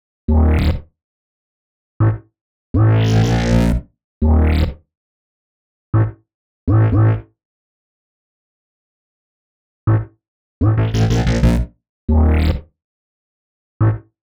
122 B#m Bass, Complex Electro Synths; Prime Loops; Specters

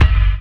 kicker, drum hits; Kicks; Loopmasters; Jon Carter & Alex Blanco; Block Party Essentials

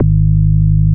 Bass Shot D#; Mutekki Media; Vengeance Electroshock Vol.2

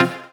Synth Shot F

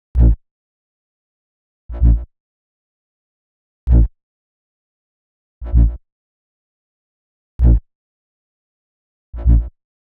129 G Bass, Bpm129, Complex Electro Synths; Prime Loops; Androids

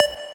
Synth Shot D; Mutekki Media; Vengeance Electroshock Vol.2

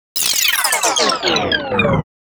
130 D#m Sfx, Bpm130, Complex Electro Synths; Prime Loops; Bias

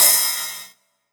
Ride, Cymbals

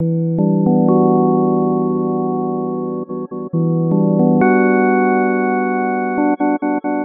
Bpm136 Locked Music Loop Em, Dubstep

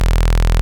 Bass Shot E

